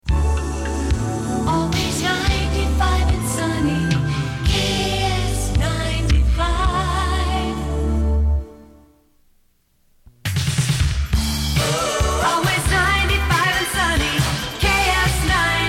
FM Jingles 1980s; KSTP

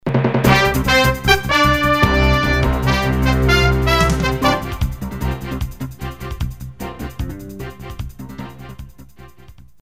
news sounder; ABC Information Network

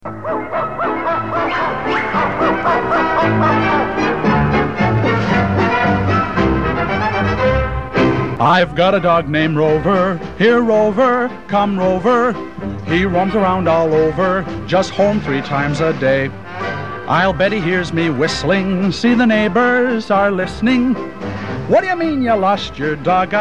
Theme Vocal; WCCO AM; Cannon

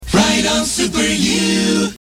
Jingle; WYOO; RO Super U

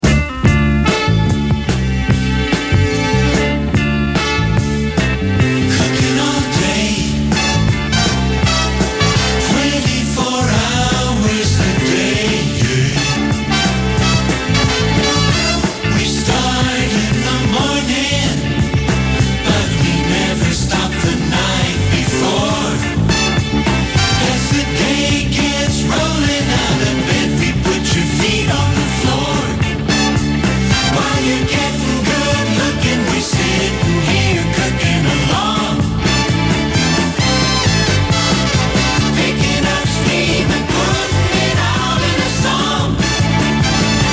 Jingle; WYOO; 24 Hours

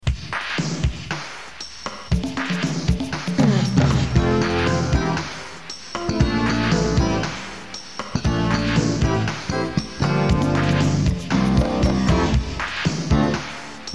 Pop Instrumental; WCCO AM

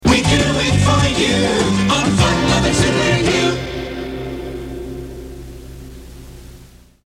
Jingle; WYOO; FL Super U

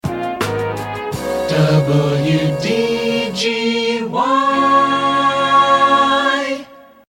AM jingle; WDGY; 2008

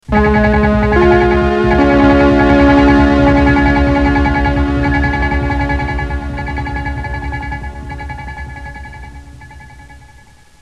Radio News Sounder; NBC; 1975-mid 1980s

